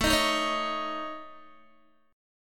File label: A Major Flat 5th A Guitar, chord; AMb5 strummed